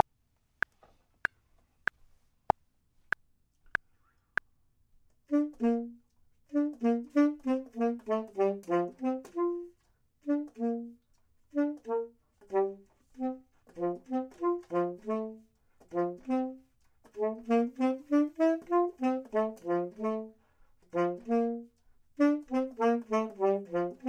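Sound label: bottom, Saxophone and Clarinet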